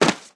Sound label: Blow Pipe Assassin; rabbids multiverse; iOS, macOS, Mobile